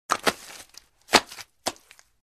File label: Mud Light Slosh; Hollywood Edge Foley Sound Library